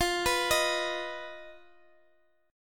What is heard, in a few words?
B Major Flat 5th F